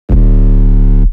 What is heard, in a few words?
Not Nice 808's